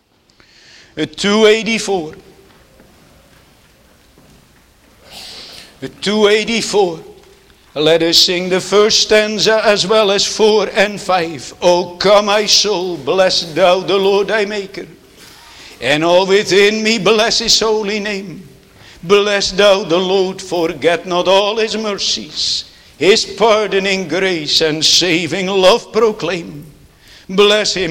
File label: Singing; Swearing an Oath; Netherlands Reformed Congregation, St. Catharines, ON